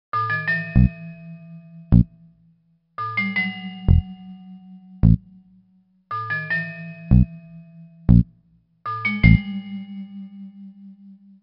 suspens synth; Plus Belle La Vie Le Secret du Dr Livia; 2008 nds gamerip

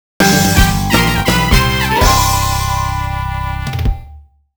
Level Win; Despicable Me Minion Mayhem; Nintendo DS

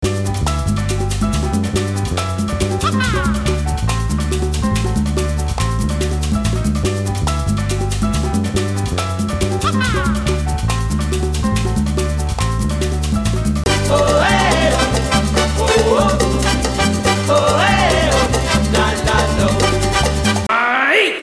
La la lo, Vocal; Total Overdose

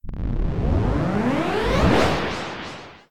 Shatter

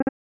Cancel

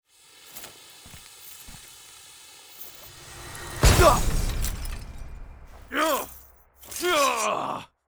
orb shatters; cutscene 3-3 hikaru

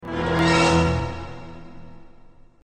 Stab Horror Reveal

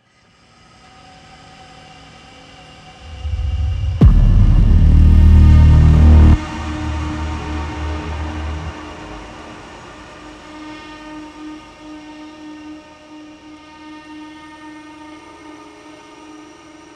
Combat Suite Main Ambient; Doom Eternal; PS4; Stadia; Xbox Series X/S; Super Gore Nest

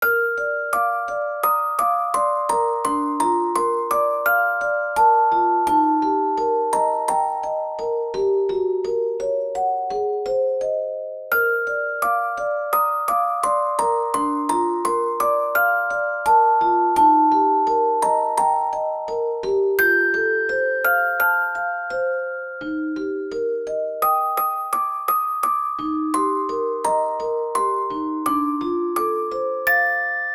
Orgel; Utsuriginanakoi Tenki Ame original sound track; Tournesol

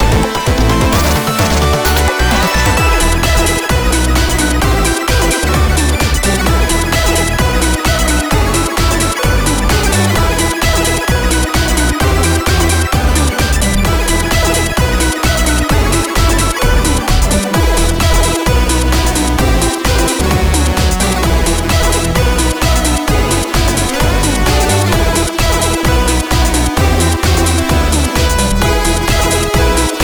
Killing Spree 8-Bit; Awesomenauts Ultimate Edition; Yoolip

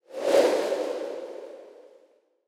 Ui Trans To Main Screen Wind; portal quest; android

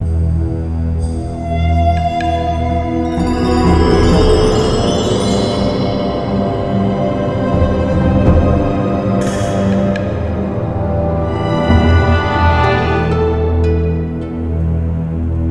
Caves Melody Loop; Ninjago Monastery; lego universe macos windows gamerip 2010

